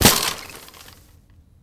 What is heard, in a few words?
Bike Old Rusty Droppi